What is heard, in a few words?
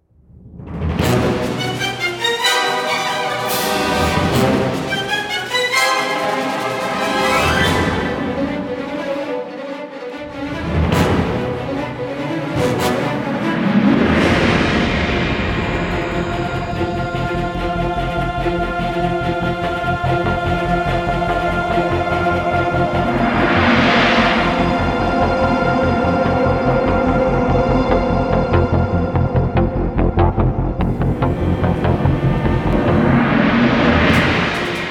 Combat Dogfight; Elite Dangerous